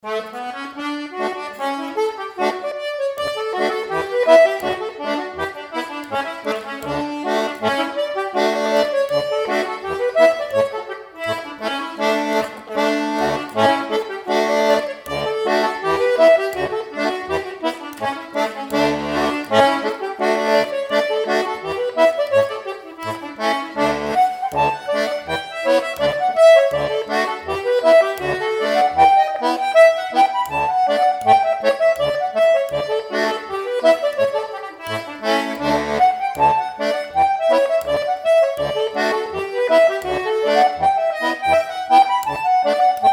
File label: 165Bpm